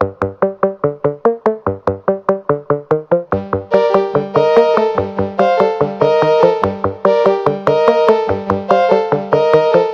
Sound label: eight bit, Electronic Loops